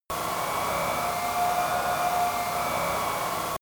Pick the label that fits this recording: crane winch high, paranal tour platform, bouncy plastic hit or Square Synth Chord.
crane winch high